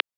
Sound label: telephone